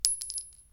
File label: Shell 9mm Luger